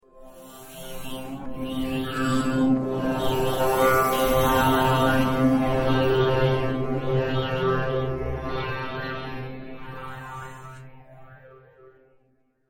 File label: Through The Mirror Darkly, scary soundscape; horror sound effect